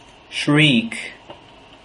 shriek

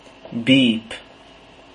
beep

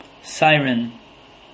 siren

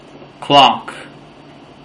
clock

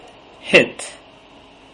hit

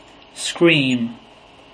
scream

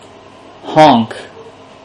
honk

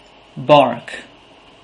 bark

